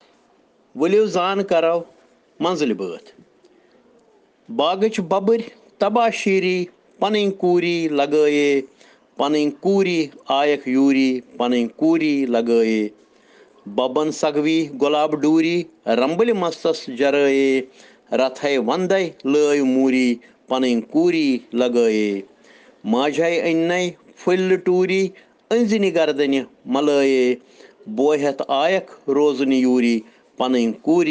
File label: Children Rhyms in Kashmiri